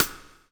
BLUES TK, Backbeat; Spectrasonics - Backbeat

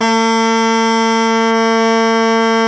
BASS SHAWM; SoundBytes - Early Patches

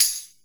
PERC, 160 BPM, Drum & Bass; Ueberschall; Drum & Bass Flash Trackz

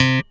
C2 SHORT, STIK BASS; D-STIK BASS1

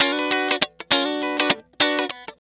Electric Guitar

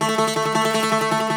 Greek Bazouki, Plucked Strings

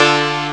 accord; Amiga Soundtracker Sample Packs

